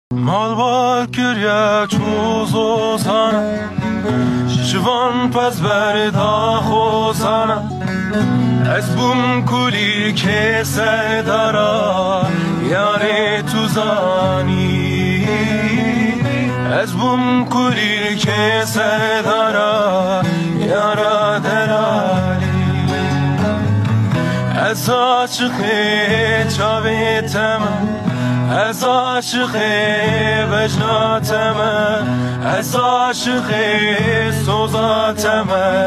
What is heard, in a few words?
kurmanc music; Kurtcemuzik